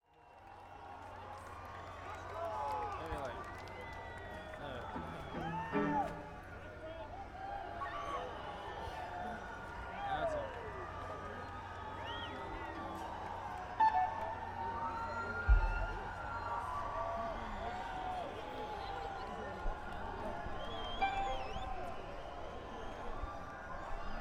Tuning; Limestone, ME; Loring Air Force Base; IT Festival

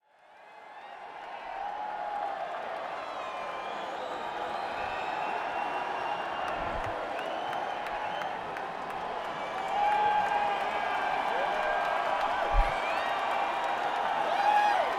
Crowd, Alpine Valley Music Theatre; East Troy, WI

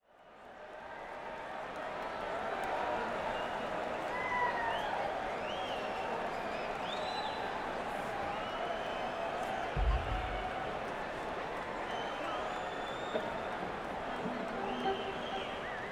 Crowd, Madison Square Garden; New York, NY

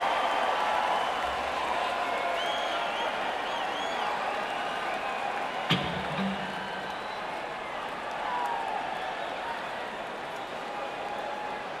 Crowd; Charleston, SC; North Charleston Coliseum

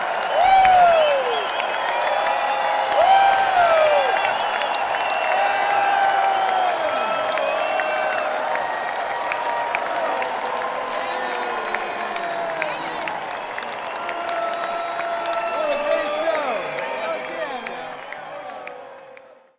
Crowd; Alpharetta, GA - Verizon Wireless Amphitheatre at Encore Park

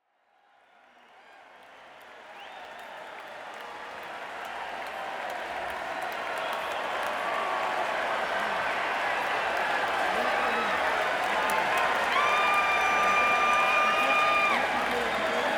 Crowd; Mansfield, MA - Xfinity Center

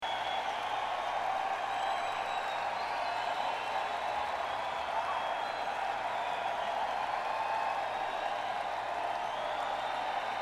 Crowd; Saratoga Springs, NY; Saratoga Performing Arts Center

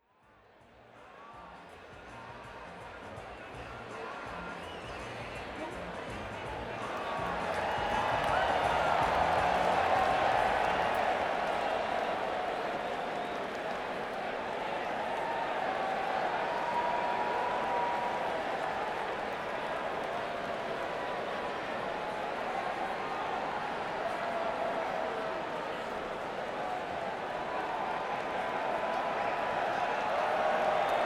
Crowd; Worcester, MA - DCU Center

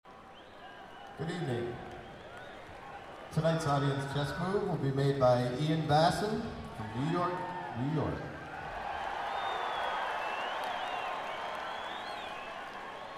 audience chess move

